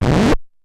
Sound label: Needle Scratch